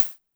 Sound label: polyvox hat, Drum Machines, Formanta Radio Plant Polivoks